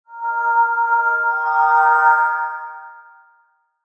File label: Logo Swell Pulse Ring; Sonic Logo Sound Effects; Production Element Imaging Element Accent Transition